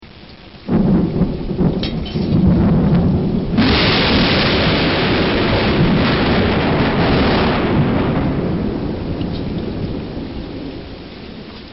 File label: scenic close, thunder